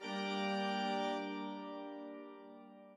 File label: chord sa g a p d g a p d